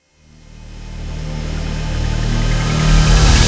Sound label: Stinger; mirror's edge gamerip